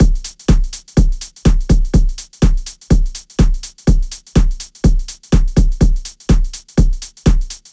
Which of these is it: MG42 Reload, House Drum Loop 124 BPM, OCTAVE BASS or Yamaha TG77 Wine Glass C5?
House Drum Loop 124 BPM